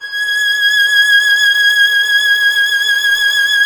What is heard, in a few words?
violin G0, Violin; Miroslav Vitous - Solo Instruments VOL-2